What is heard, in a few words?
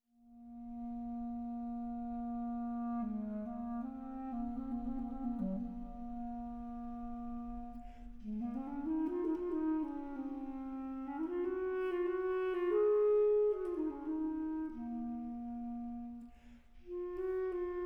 Fugue No. 16 In B Flat Minor